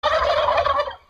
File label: Turkey Notification